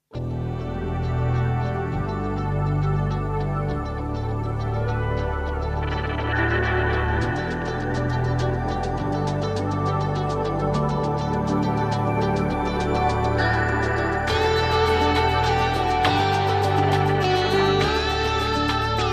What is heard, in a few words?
Ambient Groove